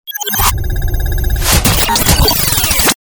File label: Galery Noise; Sound Ideas - Galery Noise 001